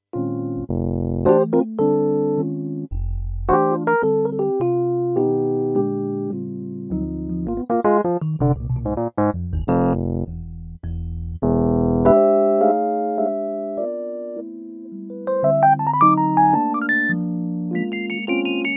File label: stagepianos kurzweil rhodes